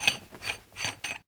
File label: toggle flame; Rust